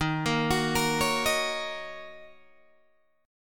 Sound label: D sharp Minor 7th, Guitar, DADGAD